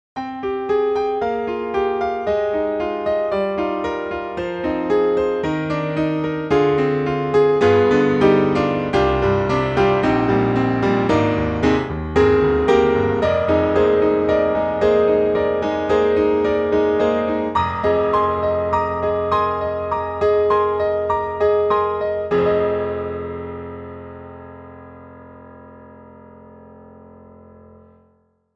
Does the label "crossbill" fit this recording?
No